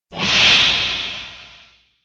flyby; tos

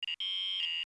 input failed clean, computer

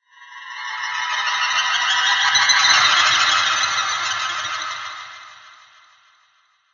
beam down

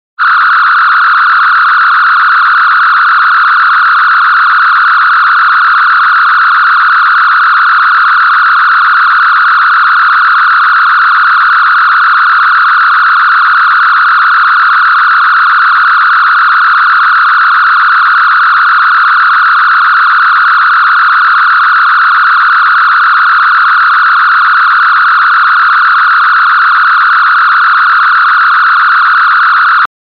phaser

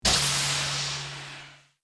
door open; tng

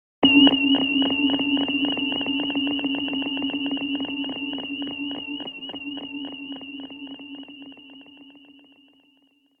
medical scanner; TOS

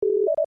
computer beep

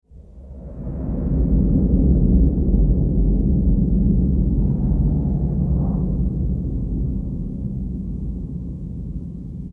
flyby; TNG

